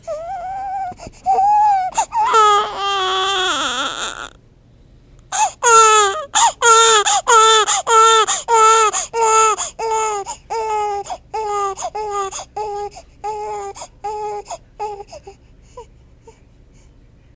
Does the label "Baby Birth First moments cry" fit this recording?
Yes